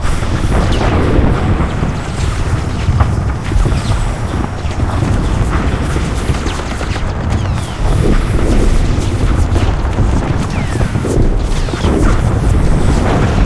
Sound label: close battle loop, ambience; Quake 4 Alpha; id software